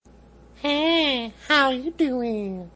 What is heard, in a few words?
haaaay